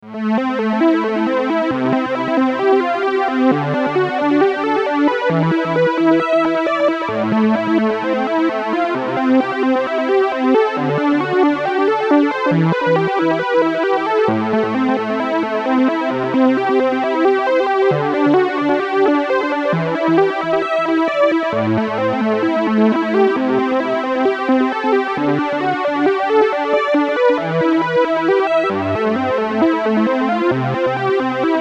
Solo Lead Delay

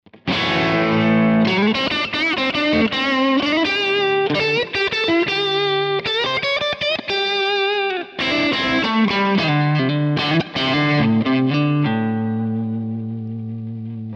Neck SC Crunch; Ibanez AZ226

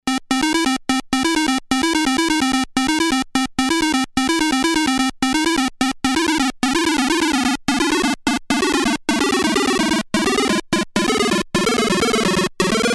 Sonicsmith chiptune seq

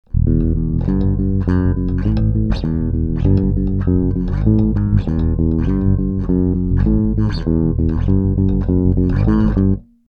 low

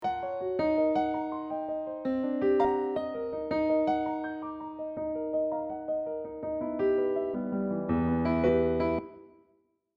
Arper Piano

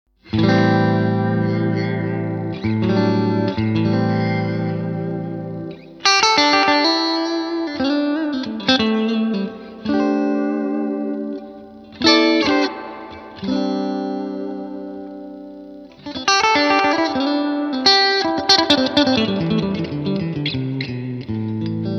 Fender Tone Master Princeton Reverb clean Hall

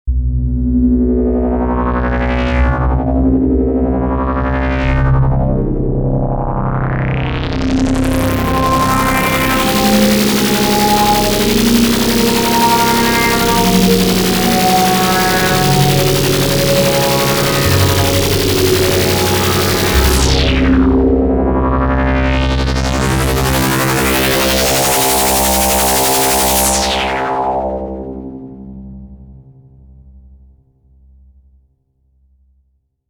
Elektron Digitone Strobe

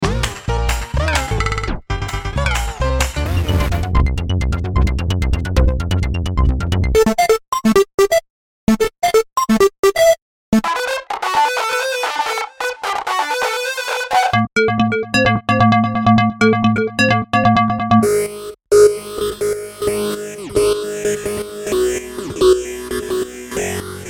Bonus Loops

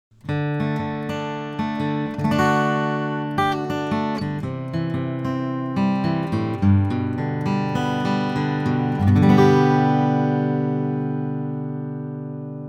Picking Git Gain 50db; NEVE1073

